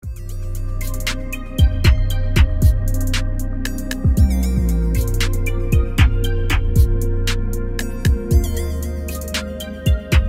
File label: trap remix; grand theft auto 5